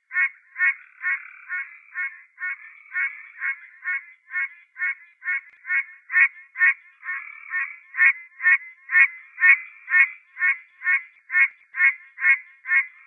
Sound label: Pine Barrens Treefrog; WW Knapp